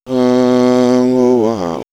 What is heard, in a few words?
Ethno Vox Rwanda; vox mix; X-Static Goldmine